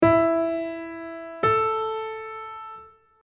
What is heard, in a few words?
recognition of intervals; pop vocals; grade 6